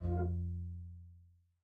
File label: lower oneshot hoover